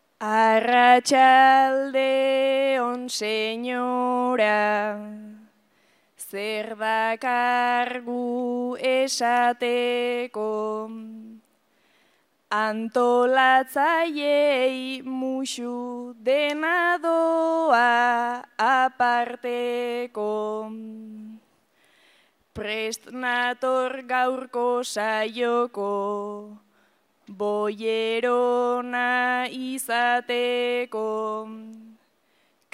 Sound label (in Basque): agurra, bertsoa; portugalete